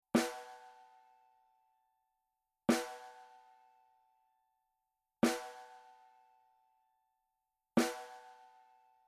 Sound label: SNARE Edge Soft